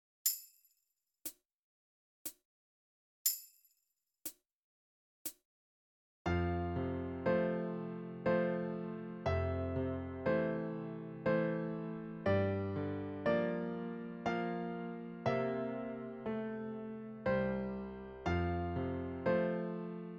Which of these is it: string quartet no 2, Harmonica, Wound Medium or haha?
Harmonica